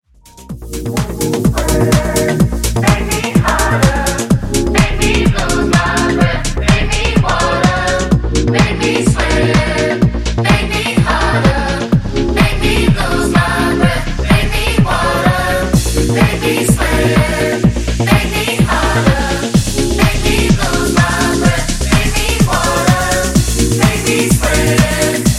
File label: Funk Clean 126Bpm; 2Osc Edit